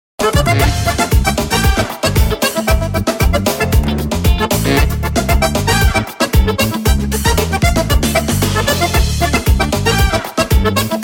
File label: dance; guacho